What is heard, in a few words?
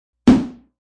Hit Snare; Rollover or button sound perfect for web pages, flash animation, and other web applications.; Musical